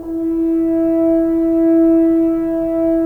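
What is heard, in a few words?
Solo French Horn, Brass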